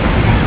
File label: close door